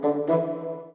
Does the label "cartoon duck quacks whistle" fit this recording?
No